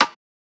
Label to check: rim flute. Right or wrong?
Right